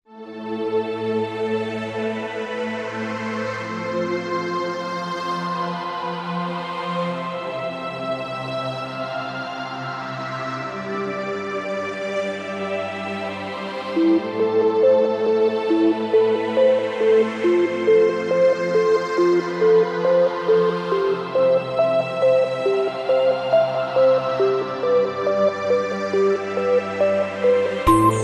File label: Instrumental Sad Music